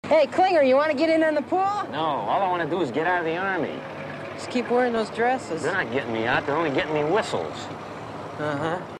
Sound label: whistles